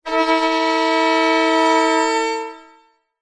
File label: musical violin bowed 2 notes simple